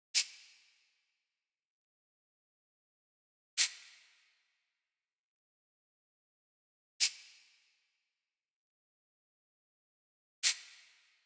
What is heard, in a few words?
Drum Loop, 140 BPM Shaker, Drill; Loop Stems; Brute